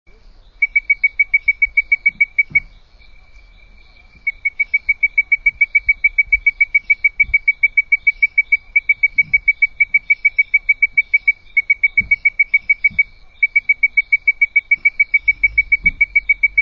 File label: Leptodactylus mystacinus